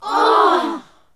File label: NOISE, CLASSIC VOX; Best Service; Hallelujah